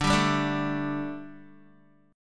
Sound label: D Suspended 4th D, Guitar; strummed; JGuitar